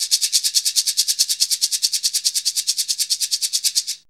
RATTLER; DRUMS & PERC

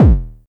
909-ish Kicks D#1, Tuned Kicks; LIMAT Div Dept; Drums

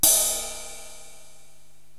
Ride Edge, Korg TR-Rack drum machine

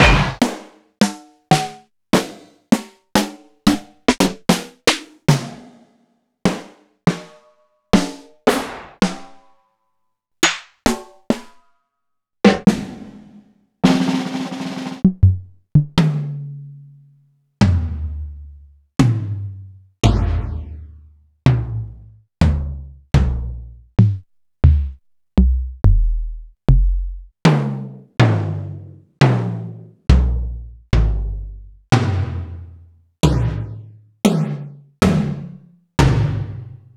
Alesis DM53

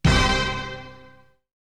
Hit; ZERO G - Datafile DISC 1

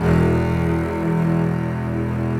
E1 CELL; AKAI S-Series CD-ROM Sound Library VOL-7; CELLO+VIOLIN

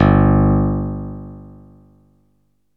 PIANO G1; Best Service Alpha Dance vol.2

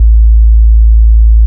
bass sub; Ueberschall; Techno Trance Essentials

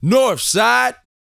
VOCAL F; ILIO

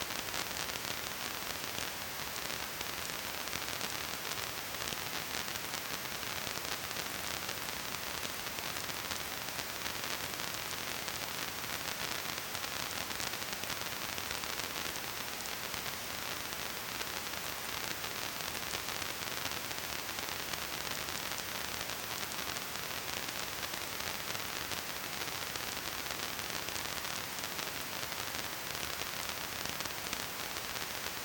Hiss Noise, Phonograph; Roland BK-5

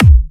Trance Kicks, Bassdrums

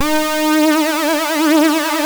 Synth and Organ; InVision Interactive; Keith Emerson

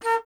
Alto Flute; Miroslav Vitous; Solo Instruments

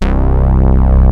MOOG BS, D - 70; Rubber Chicken Software Sample Bank

